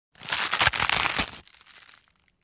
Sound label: Ripping apart